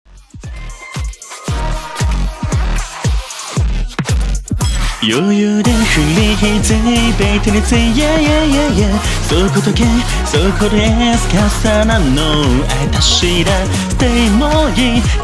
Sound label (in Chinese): 翻唱声线可调